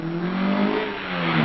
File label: car; Car Racing; Multiplication of five digits